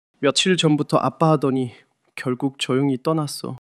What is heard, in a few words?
crying